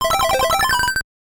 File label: OPEN MENU; KGB; valve